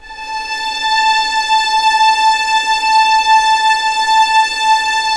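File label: A5 legato mf violins R; East West; The Ultimate String Collection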